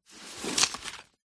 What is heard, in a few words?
leisure trading cards or playing cards handful pick up from table